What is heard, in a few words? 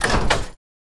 click Mechanical slower